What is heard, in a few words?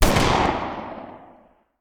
Hand Gun; Sound Ideas; Guns